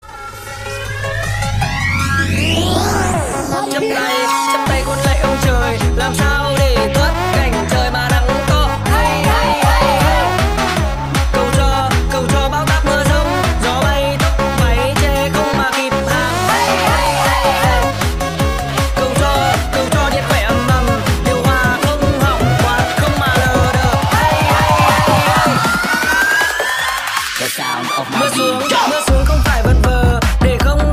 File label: bass remix